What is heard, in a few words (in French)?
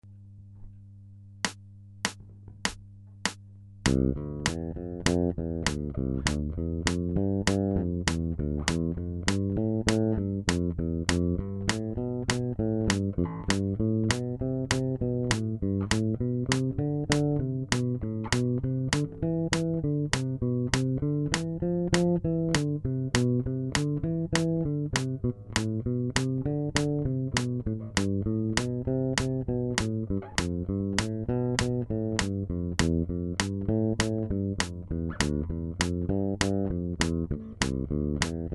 croches